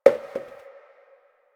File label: Janitor Paper Towel Drop